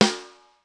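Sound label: lo picolo, snare; sr16 sd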